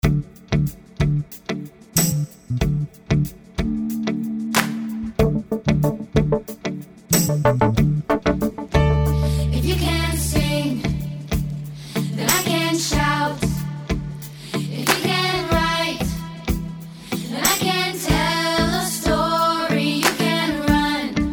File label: Good Gifts Traditional Vocal